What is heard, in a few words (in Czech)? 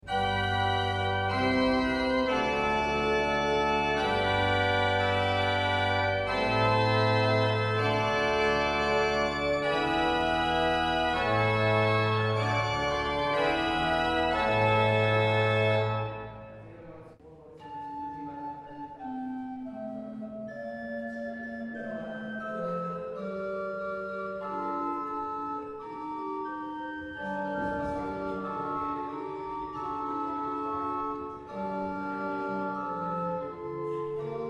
místních varhan; Jesenici